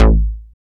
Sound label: BS STAB; AMG - Now CD-ROM (Roland); Pete Gleadall; Samplography